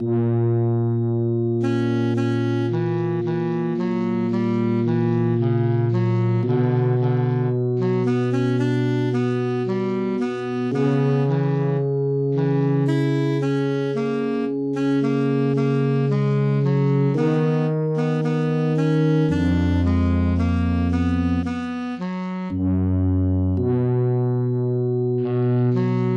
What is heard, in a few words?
MIDI